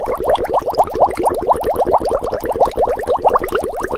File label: bubbles drowning